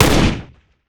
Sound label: autorifle fire; weapons